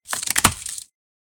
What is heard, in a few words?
chop tick